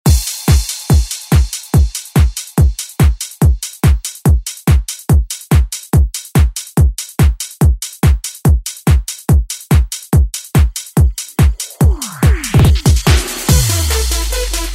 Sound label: 90s ReDrum; DJ Mhark